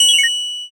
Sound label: ice magic